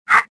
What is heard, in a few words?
jump, worker; worker3